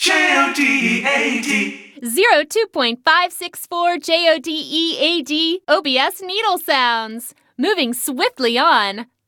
Radio Jingle; let it die; OBS

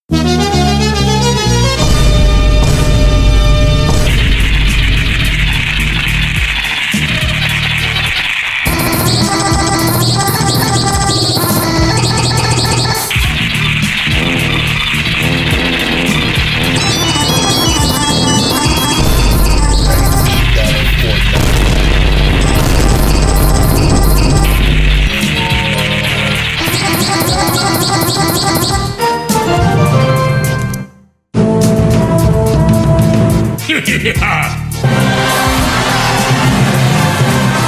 Instrumental; Friday Night Funkin' Mic of Time GBC

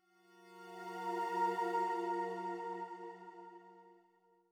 Calm Pad; super mario maker 2; Peaceful SFX Raws - Otoasobi

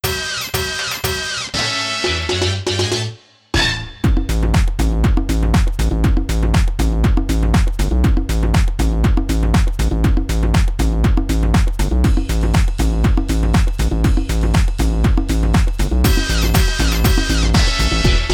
Zombie Dance Party

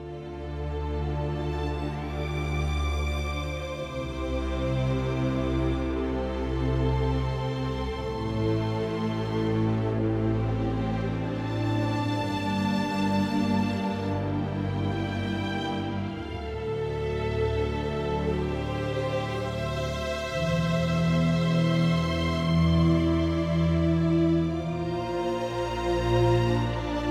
Cinematic Music - Sadness

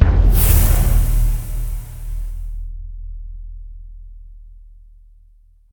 flame

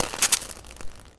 Explosion Fire Gas fuse stop burn out bomb; MONO; dynamite; ProSoundCollection v1 3

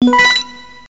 Credit SFX; Arm Champs II; arcade

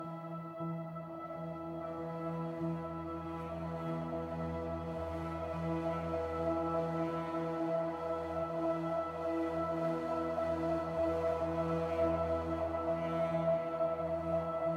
MainMenu Loopable; Xel

